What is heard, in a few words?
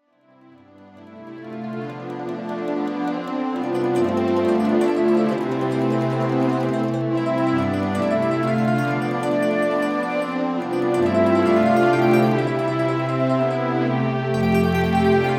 italian movie song